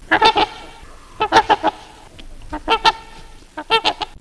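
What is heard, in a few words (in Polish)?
trębacz, łabędź trąbiący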